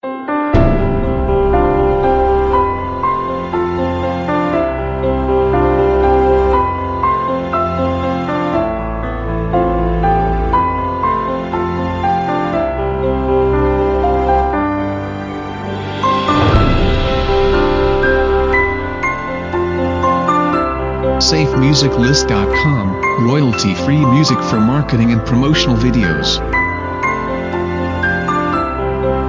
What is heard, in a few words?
Beautiful Wedding; SafeMusicList